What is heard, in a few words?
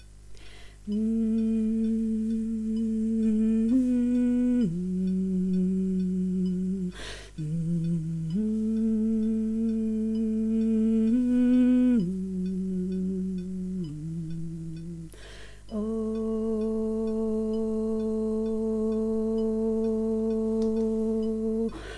Bass